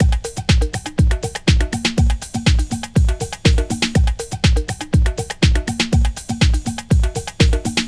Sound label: ELEC PERC; CONSTRUCT 2